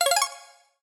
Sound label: decision, button